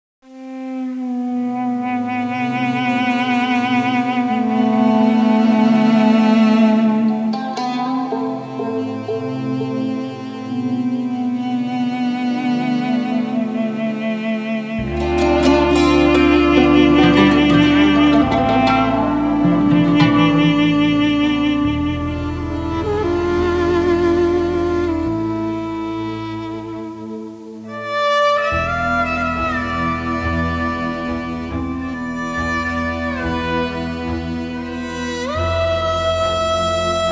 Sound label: general Sad Music